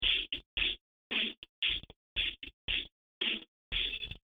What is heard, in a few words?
cell phone